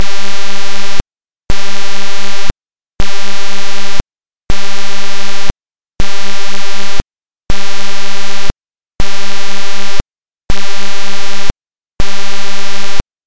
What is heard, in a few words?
Buzzing Alert Analog Looping